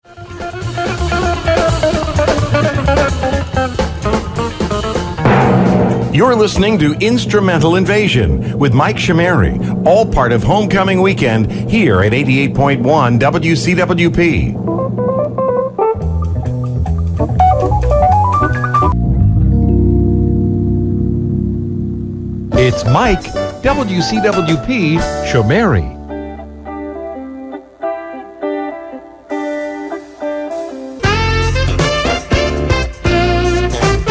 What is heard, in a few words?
instrumental fm stream transitions; 2018 pre-recorded show transitions; pre-recorded and mixed from home